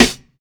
studio steel snare drum sound D# key, Royality free snare sample tuned to the D# note.